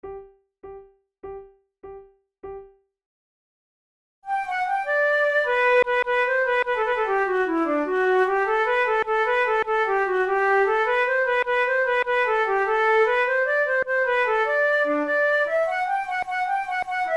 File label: gigue, flute